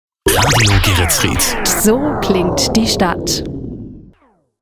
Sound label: Jingles Shotgun; Radio Geretsried